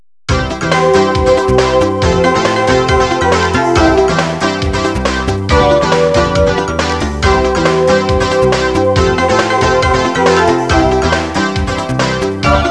suonerie businessy

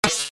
suoneria botton; beep

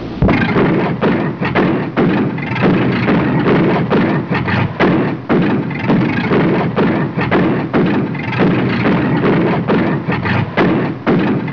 Accensione Difettosa, auto; trasporti

